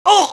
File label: damage, pain; mohaa; dialogue